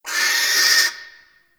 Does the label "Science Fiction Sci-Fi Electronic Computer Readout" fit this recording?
No